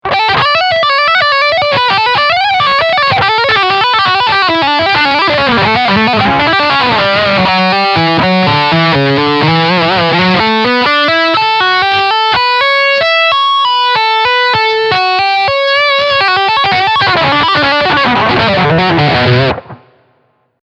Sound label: Lick Steg HB Distortion